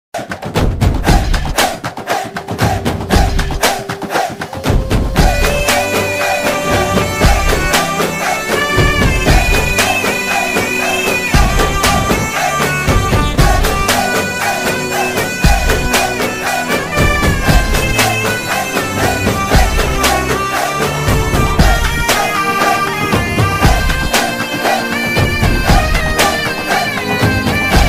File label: bgm ringtone tamil bgm ringtone